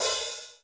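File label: GOGO open hi-hat, GO GO DRUMS; Crypton - DrumBase5000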